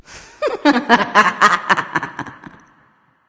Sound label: laugh; smite